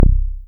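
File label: Moog Rouge, Bass; Best Service Gigapack 2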